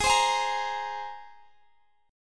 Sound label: G sharp Major Add 9th, Guitar Standard; G#add9 strummed; JGuitar